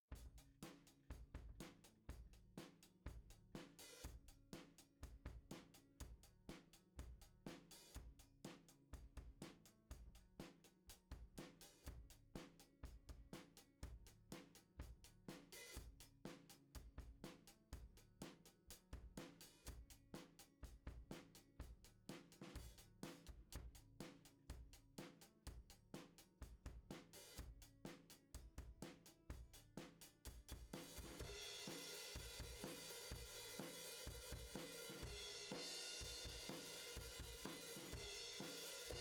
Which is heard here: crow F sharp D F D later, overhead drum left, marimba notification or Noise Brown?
overhead drum left